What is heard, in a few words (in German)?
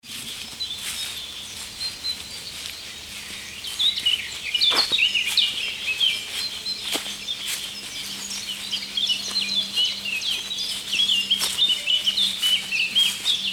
Obstwiese Voegel mit trockene Wiese Laufen